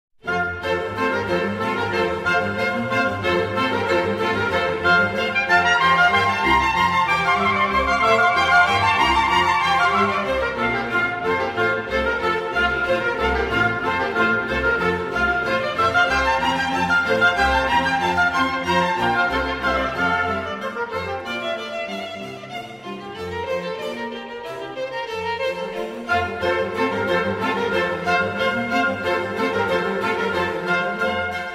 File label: Bach Brandenburg concert; ALEMANIA